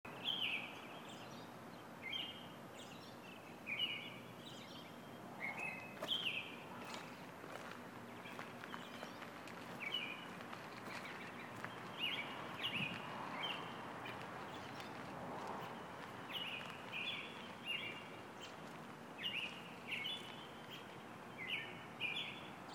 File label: birds